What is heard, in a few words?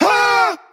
huh male, Voice; ooh ahh; heh huh